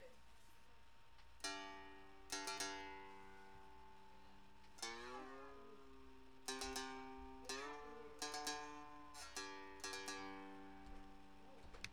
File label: monte g acoustic